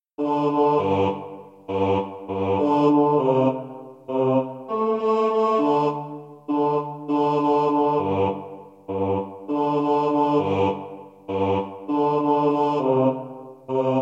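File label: Shosholoza CHOIR karaoke bass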